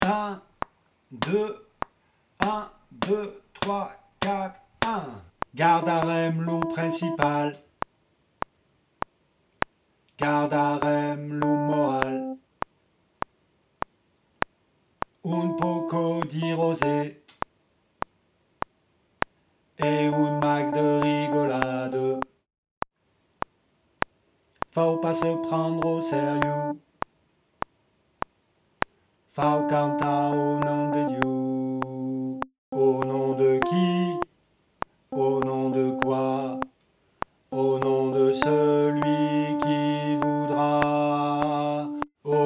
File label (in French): Alti, chorale